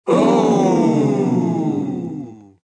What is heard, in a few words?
oooh, taunt; Age of Empires II HD